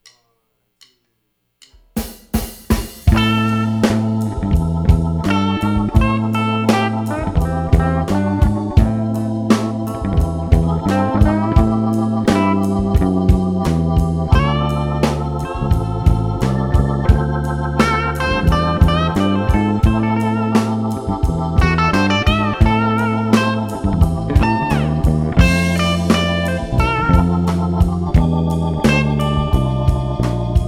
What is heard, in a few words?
Slow Funky Blues Variation